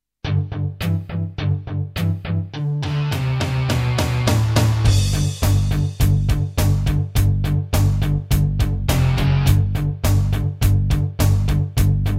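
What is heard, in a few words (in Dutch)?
Rock en Holbewoner, gitaar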